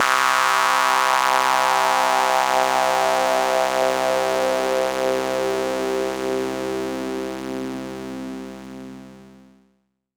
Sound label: PAD C4, Yamaha CS40M synth pad; Pads